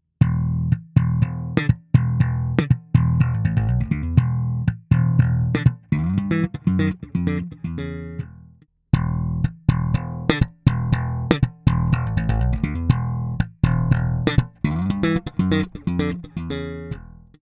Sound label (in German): latenzen Bypässe; Gt1 BGT 10B